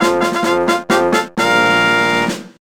Celebration Riff; Dora the Explorer Dora Saves the Mermaids; Nintendo DS

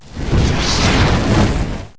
attack boss Fireball; Burning Dead

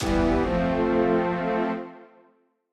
Boss Clear Sound

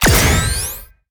Activate Superbattery; boom karts multiplayer kart racing android

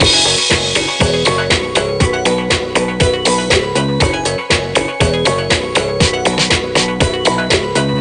Music Dance

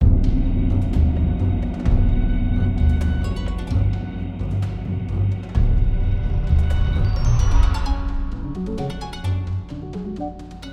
Tao Neutral

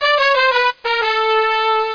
Jingle Result Bad; Gotouchi Kentei DS

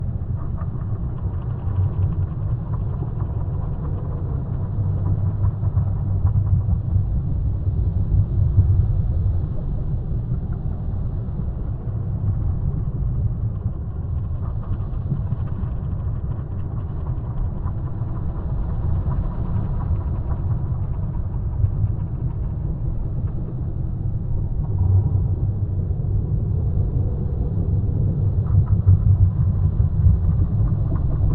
Ambiance Dark

